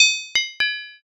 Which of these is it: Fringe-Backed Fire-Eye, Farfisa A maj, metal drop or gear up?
metal drop